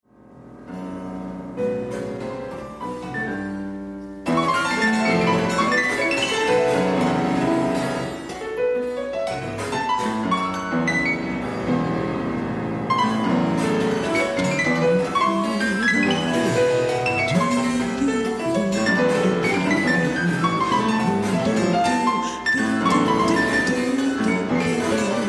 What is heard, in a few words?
Improvisation Group